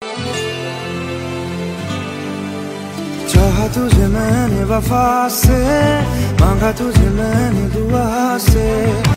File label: Hindi Song Ringtone